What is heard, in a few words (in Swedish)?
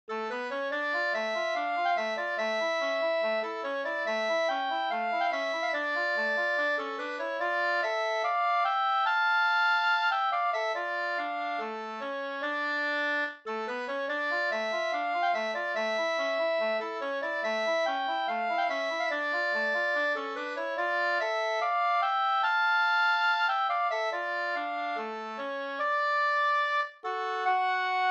skotsk